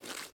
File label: ice jump